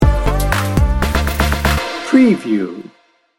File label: urban toes sting bumper